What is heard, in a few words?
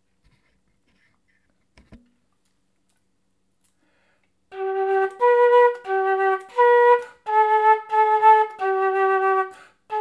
music